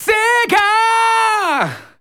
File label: SE GAME BREAK; maimai